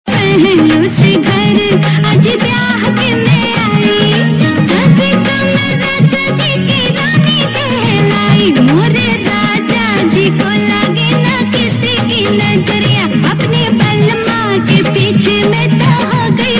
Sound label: Sangeet Song